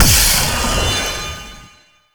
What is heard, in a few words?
Wave Ability Charge Up; Enemies; Abilities; Warframe; Duviri; Dax Knight